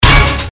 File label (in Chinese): 跳舞跺地; 音MAD; 蓝蓝路